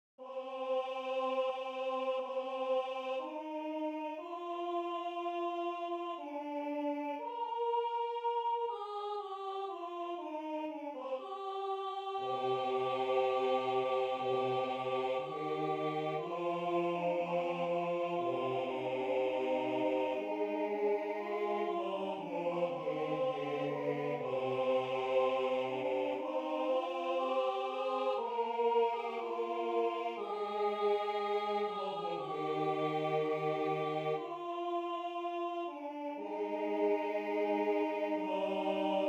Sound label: Alto Bass; Mantua